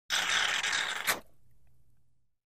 Plunger; toilet

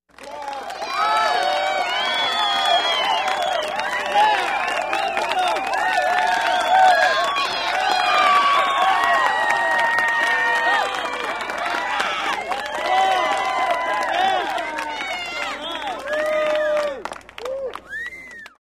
Ovations of fans, children and teenagers; standing ovation

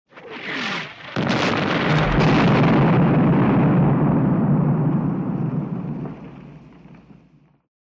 Whistle and projectile explosion; explosions

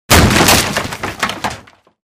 Breaking furniture, wood, blows, chips fly

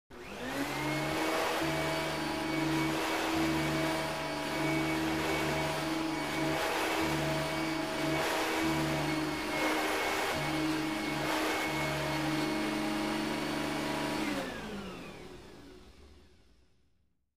Vacuum cleaner

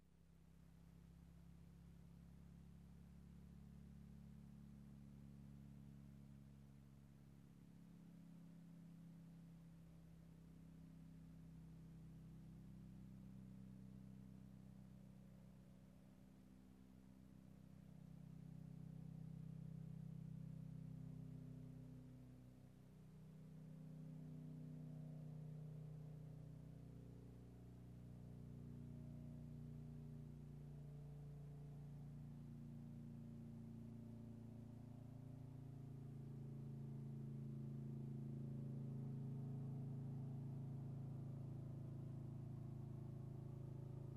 Motorcycle approaching, turning off

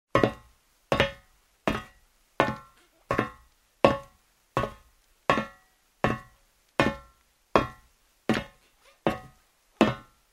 Steps on the metal floor in shoes

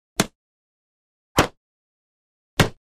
Bow, arrow hits the target; cold weapons

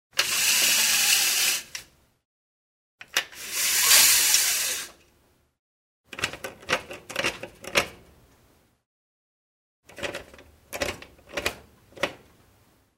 Horizontal blinds raise, lower, scroll; windows

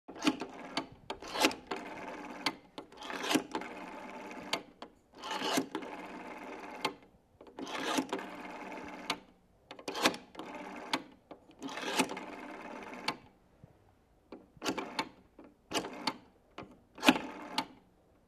Dial a number on a rotary telephone

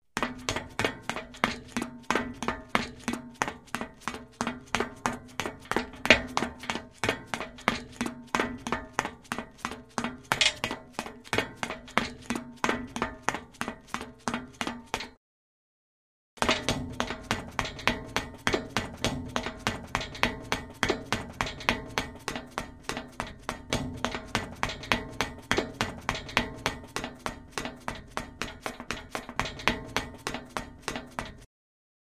Running on the metal floor in shoes; steps

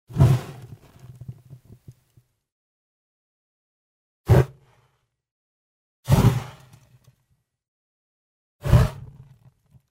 flame flash; fire

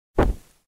Blow out the fire of a match

